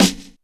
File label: bright steel snare drum sample f sharp key